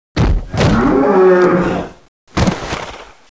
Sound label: Death